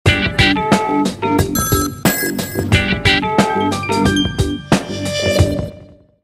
Whos calling; Samsung Galaxy Win GT-I8552; ringtones; Youth Common

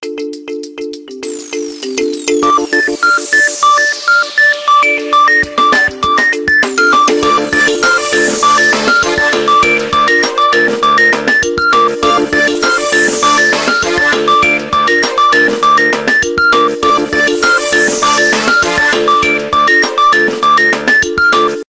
Game, ringtones; Coolpad T2-W01

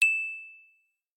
Ting; LG Viper LS840

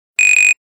Buzzer, Nokia 6220 classic

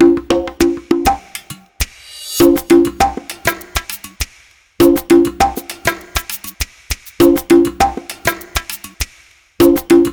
Percussion, ringtones; LG G2

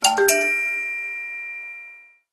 Kinetic Bell, phonetones, Motorola; Karma-QA1

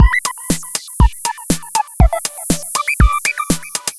Electro, ringtones; Curve Ball Blend; Google; NewWave Labs

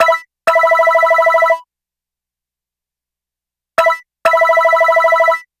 ringer office; Orange Sanza

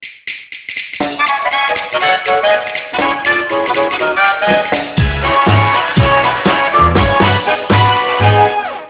Swing; Nokia; 6670; Muvee

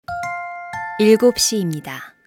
Hourly Alert

